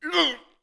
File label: battlemage die; megaglest